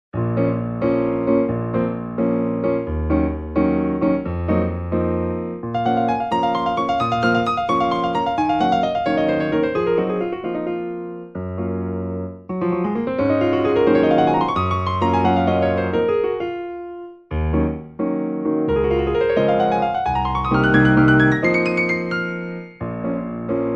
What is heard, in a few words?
piano spring, klingeltöne piano; karl frers